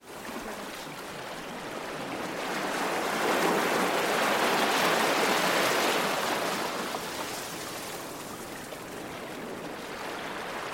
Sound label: Beach Waves Ambience; SoundsCrate